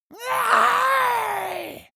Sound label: monster scream high